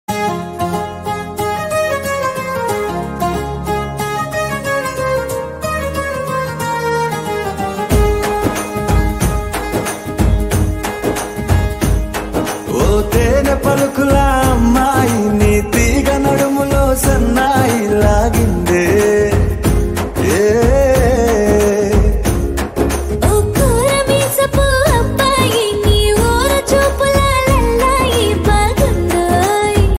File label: ringtone, telugu song, mobile ringtone; bimbisara; nandamuri kalyan ram